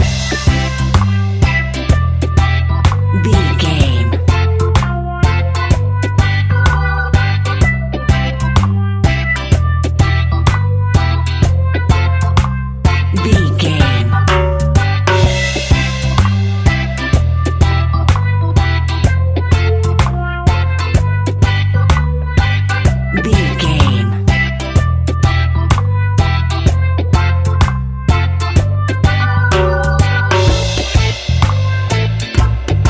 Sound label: reggae island guitar music, Classic reggae music with that skank bounce reggae feeling